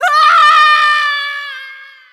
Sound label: Fall; Link falling off a platform